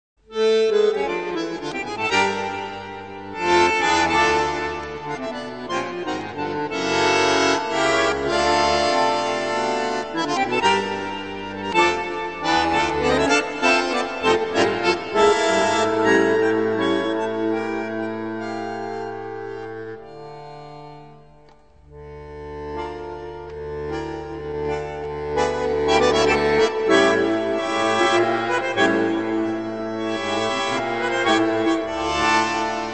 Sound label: bandoneon